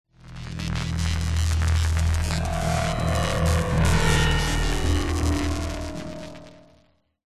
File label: science fiction power surge swell weird